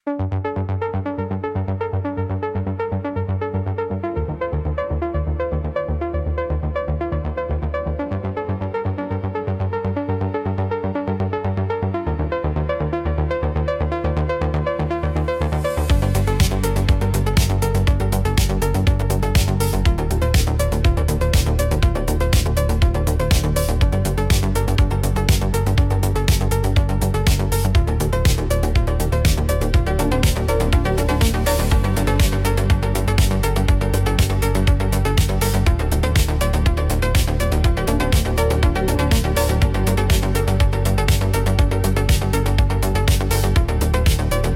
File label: Electronic Impulse